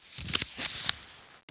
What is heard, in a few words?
paging sound long; PanzerE; Desert; CD-Gamer 1999-10